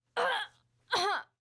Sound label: female death, human